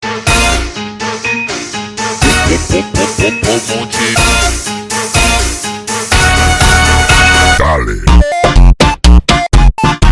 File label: Beeper Funk Slowed Down